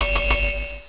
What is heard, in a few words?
chime; GameRes